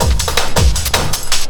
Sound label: Drum & Bass, 160 BPM; Ueberschall; Drum & Bass Flash Trackz